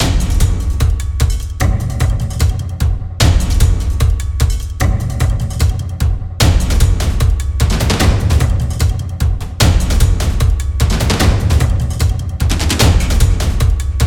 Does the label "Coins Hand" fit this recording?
No